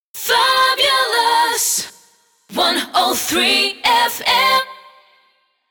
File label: Jingle Acapella